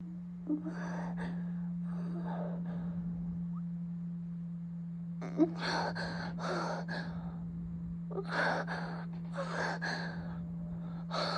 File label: moaning, whimpers; first audio; overstim; amateur; F4A